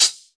sharp pedal hi hat single hit d key, foot hi hat sound tuned to the D note; one shots; hat samples